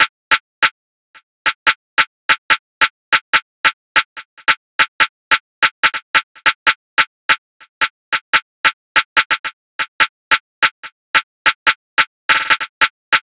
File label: Drill Perc Loop - 144 BPM; Cymatics; Fiji Hihat Loops & Percussion